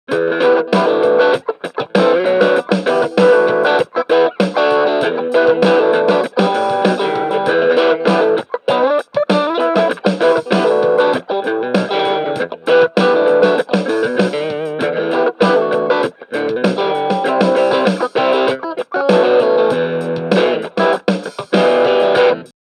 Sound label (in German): Funky Crunch mit Rhythmus